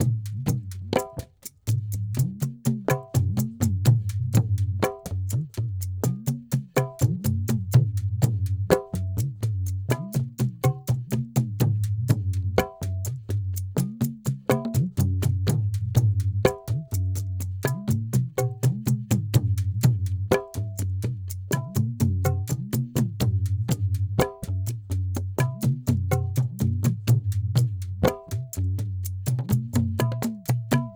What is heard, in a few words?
perc mix 062 no duff, Hip Hop India 062bpm; Hip Hop India Modern Percussion